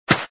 Grass; tempala

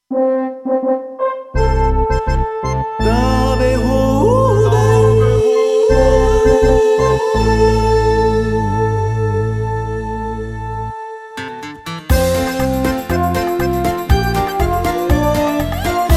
Yodel; Donkey Konga 3

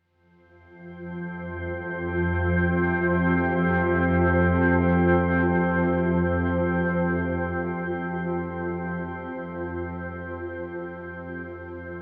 Chord Blur Siren; inside

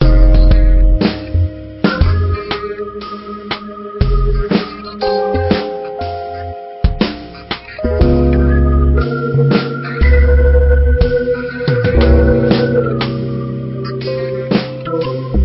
Winter Background; Pixel Gun 3D

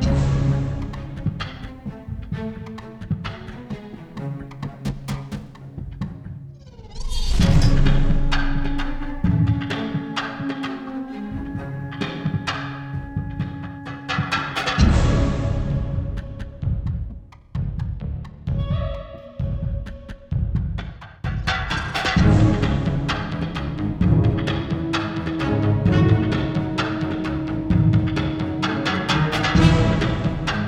Heart Battle

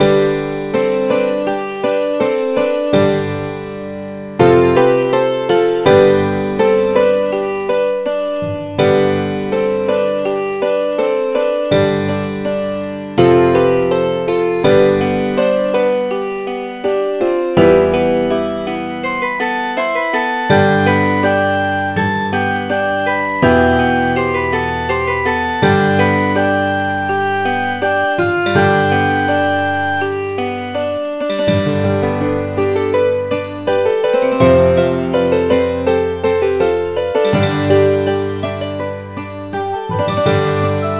Music Game; Settlement Colossus; NDS

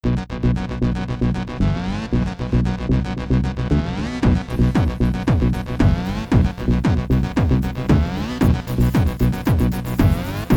Menu; powerslide

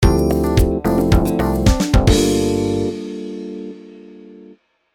Level Cleared; Java

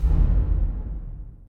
Ui Use

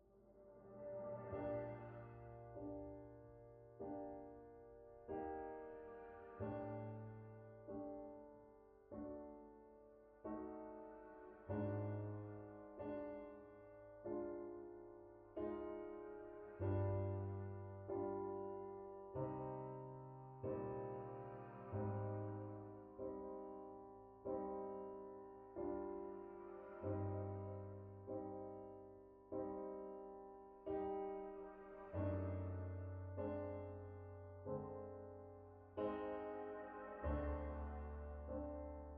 Main Reverb & Delay

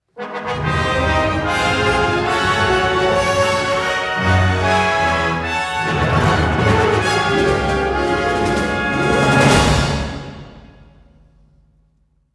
Rebel Win

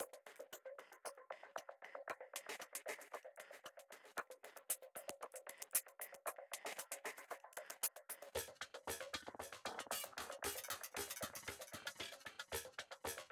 Mix Stem Layer; quantum recharged gamerip 2023

